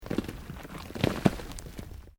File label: Earth Loose Move Short